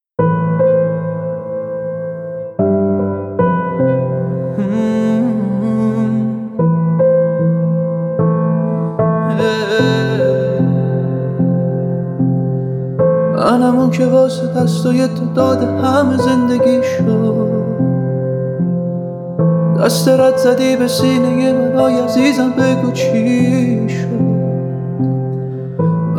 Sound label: piano version